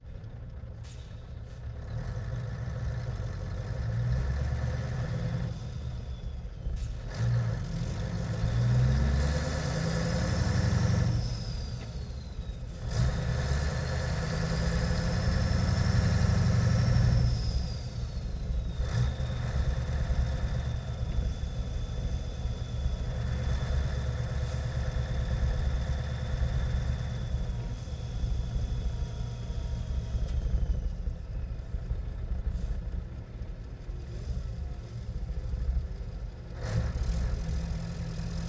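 Scania 143 onboard slow drive various interior tool box; dpa4061; Sonniss GDC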